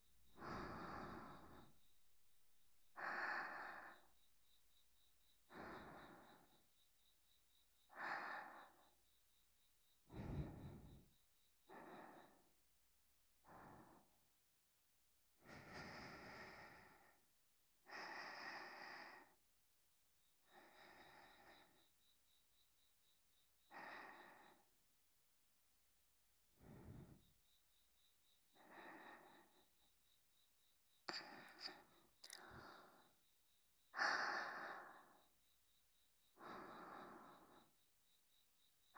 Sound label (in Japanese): 寝息 右 環境音あり